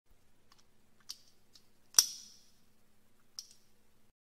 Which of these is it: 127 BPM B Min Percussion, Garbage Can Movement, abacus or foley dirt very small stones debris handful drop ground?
abacus